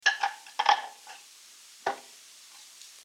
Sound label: Washing dishes